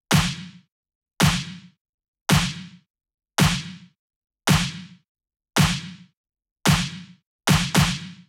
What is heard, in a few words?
Nu Disco Kit 110bpm Clap; EDM Drums; Drum Kits; Stems; Ghosthack; Ultimate EDM Drums; Nu Disco Kit 09